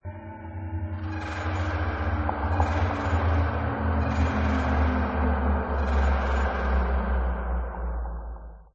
Cave Drawings; Safari Cave

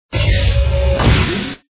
square door; Quicksilver

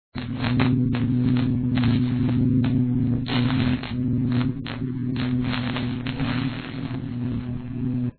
square electric gurble

